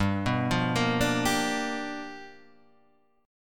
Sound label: G 7th Sharp 9th G chord